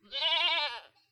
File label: goat, death; fauna